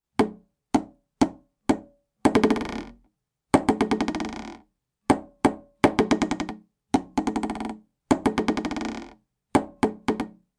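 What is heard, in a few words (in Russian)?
звук бутылок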